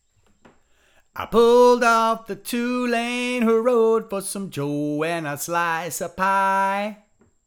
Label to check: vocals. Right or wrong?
Right